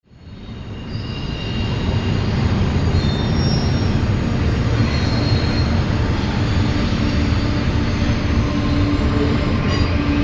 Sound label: Trains in the Feather River Canyon; train sounds echo in the canyon